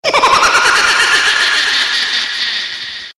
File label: wow wee sms ringtone